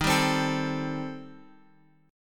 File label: D sharp 6th Flat 5th, D sharp, down, Guitar, Open F; strummed; JGuitar